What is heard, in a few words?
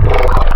Monster, Spectral; Xfer Records Serum; Serum Presets